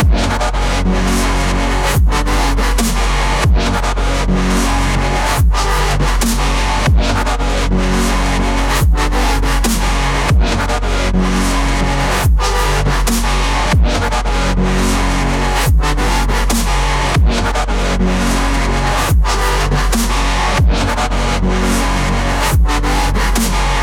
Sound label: worlds loudest dubstep